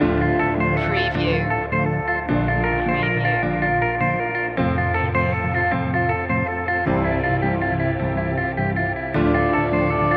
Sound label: guitar arpeggio loop